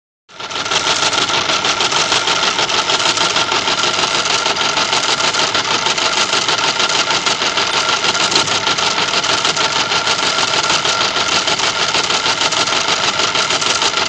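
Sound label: Machine Machine Running, sorting machines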